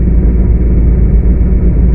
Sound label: Engine Motor